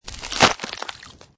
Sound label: Snow And Ice Ice Cracking With Water Underneath; Sound Effects:Weather